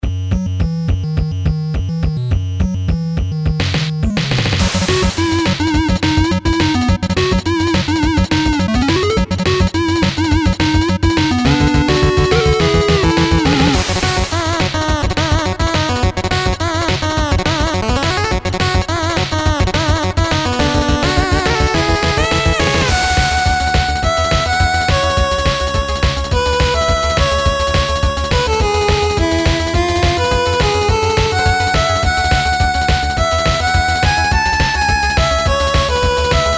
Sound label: 8-bit Aggressive